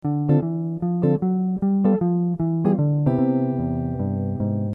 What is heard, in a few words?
chord